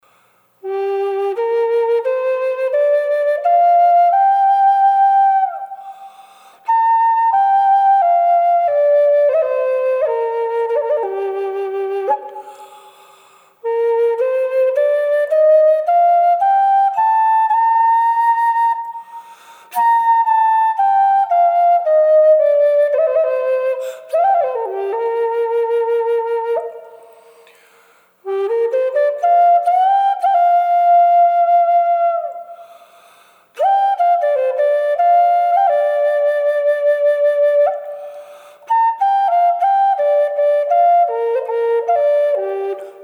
Red Mallee Burl Wet, native american flutes; Reverb added to the sample of this flute for the "Canyon" sound.; Laughing Crow

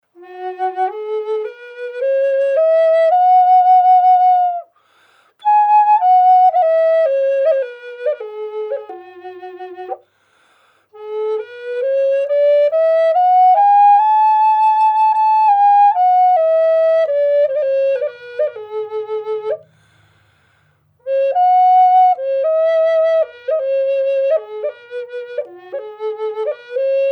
maple burl f sharp dry, flutes

